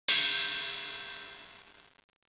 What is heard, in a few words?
CYMB